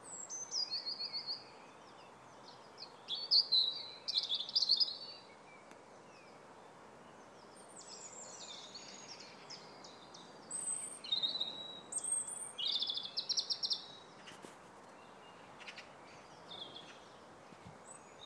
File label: sound of spring